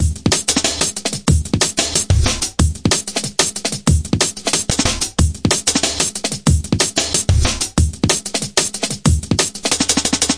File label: jamdizzy, DRUM LOOPS and BREAKS, 185 bpm